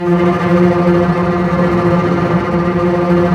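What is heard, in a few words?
double bass tremolo; String Master Series; Cbs FX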